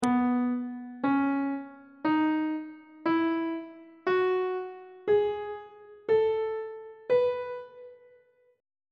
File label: c flat mixolydian mode; on treble clef